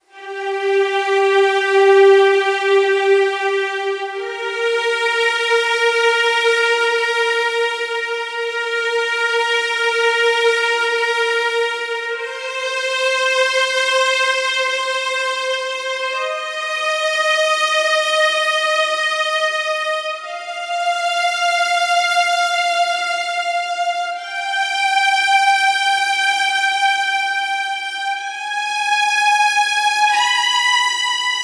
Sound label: Violas - Cm 120Bpm; Ghosthack; SkyRealm; Kit 23 - Victory On The Reach